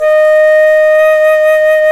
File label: FLT C FLT, C Flt Brt; Roland LCDP04 Orchestral Winds; 90 s Sample CDs; C Flutes 3-8